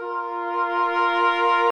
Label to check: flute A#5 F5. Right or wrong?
Right